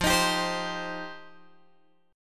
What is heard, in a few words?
F 7th Suspended 2nd F down Guitar Open G